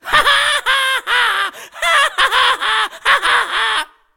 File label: laugh; 吉格斯（英雄联盟）; 爆破鬼才